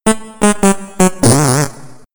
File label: Jingle Bonus Level Loss; rayman 2 remastered soundtrack